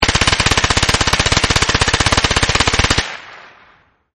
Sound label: LM7 Burst, Lm7 Machine Gun Burst Firing; Machine Guns